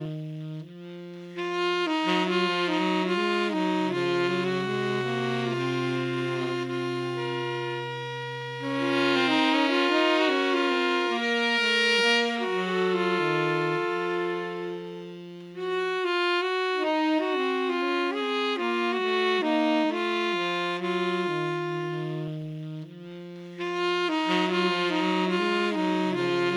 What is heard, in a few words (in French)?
polyphonie boucle